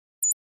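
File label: Flash Beep Electronic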